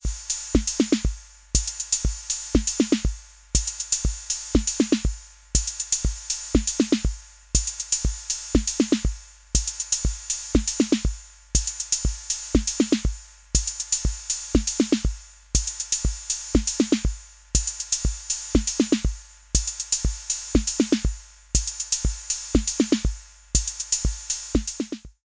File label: Roland TR-06 Boutique Beat